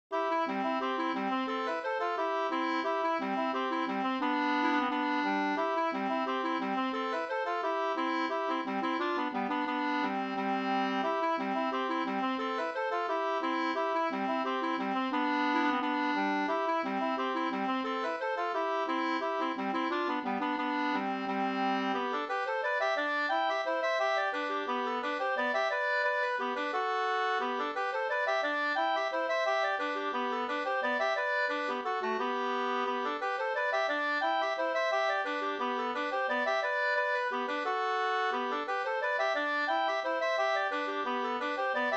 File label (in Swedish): engelska, C G dur; fr Tolg